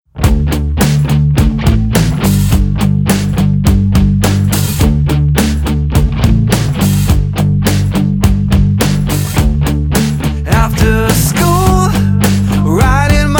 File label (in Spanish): Rock Alternativo